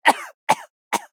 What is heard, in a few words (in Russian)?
Кашель; Лусио